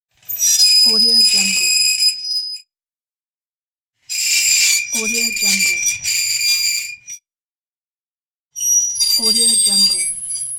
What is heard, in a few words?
metal grinding sprape